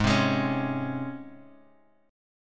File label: G sharp Major Add 11th G sharp; G#add11 strummed; JGuitar